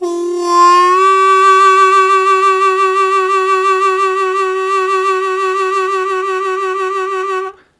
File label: Strong Vib w Scoop G1, Harmonica; Zero G